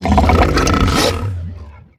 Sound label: idle in, barnacle; Half Life Alyx